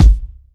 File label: bassy bass drum g key; kick drum samples